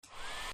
Hairdryer on, Hairdryer: Domestic, Handheld, Switched On; Miscellaneous Electronic (Sound Effects:Electronics)